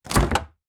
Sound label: door open